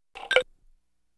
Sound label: Ice Cube; Haushalt